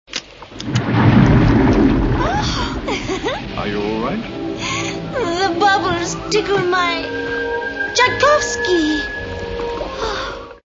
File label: bubbles